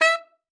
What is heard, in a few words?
E5, SAXOPHONE; Session Instruments; Best Service ProSamples vol.42